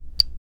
relaxing button clicking

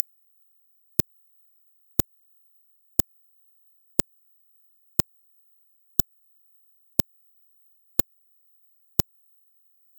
ui, button

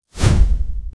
big smooth explosion of s; shimmering particles

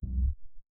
short deep synthesizer

